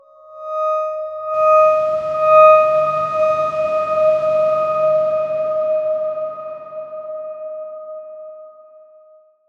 Darkswarm D#5 f; Ableton; healing soundscapes

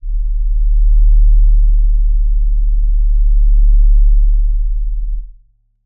Crystal D1 pp; HSS Ableton Pack1

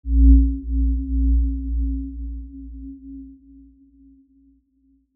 Aurora C2 p; healing soundscapes; HSS-Custom-1

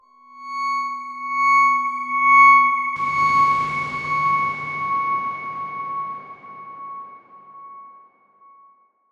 Darkswarm C#6 f; HSS Ableton Pack1

